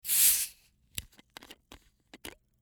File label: soda cap